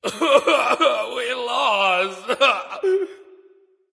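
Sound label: nonverbal boohoo; smite; voicepack; ullr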